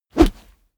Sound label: whoosh fleshy hit; swooshes-swishes